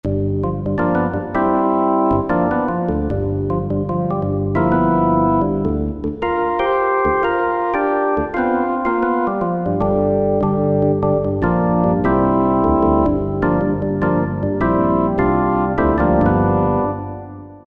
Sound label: 4 Bar with Percussion 2nd, Rock Keyboards; Greytsounds Philip Wolfe's Rock Keyboards